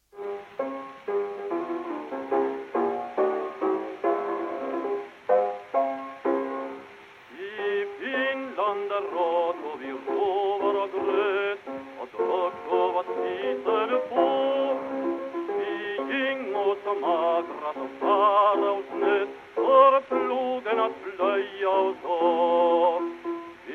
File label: Gramophone